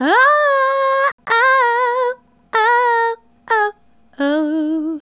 Adlib, Hip-Hop and RnB Vocals

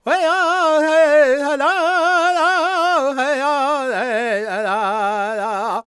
NA C# MAJ, Vocal Planet World Voices LAKOTA; ILIO; Vocal Planet VOL-5

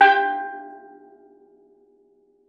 STEEL DR; E-MU Producer Series Vol. 7 – Old World Instruments

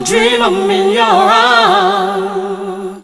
Funky Vocals; USB Soundscan vol.18; AKAI

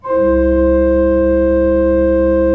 C1, Cathedral Organ; ILIO; Propeller Islands Cathedral Organ

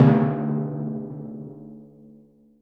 TYMPANI ROLL; Greytsounds VOL-1

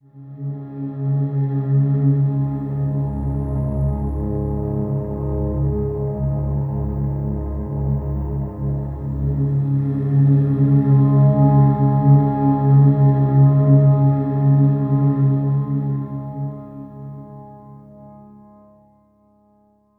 Low Complex Voice, Complex Wave

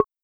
Bongo Hi, Roland TR-33; Drums

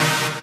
Synths Root C

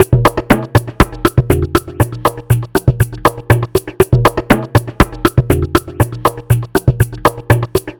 Ethnic Drum Loop; Sonic Foundry (Sony Creative Software); The Electronic Music Manuscript Collection

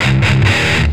Riff, Industrial; Nasty

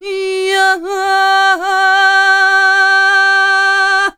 MEH1 VOX, Balkanish Traditions

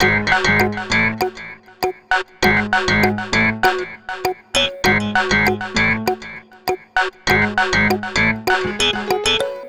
Melody, Hip-Hop

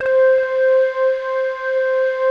SINE MELTS, pads; Pro-Rec - Synthsonic; TR PADS

